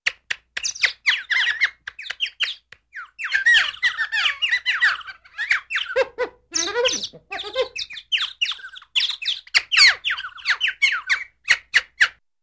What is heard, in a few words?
Squeaky Laugh Brids, birds